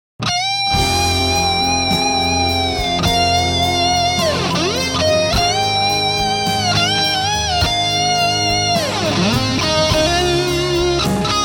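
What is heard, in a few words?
solo EMG Strat Rams Head, guitar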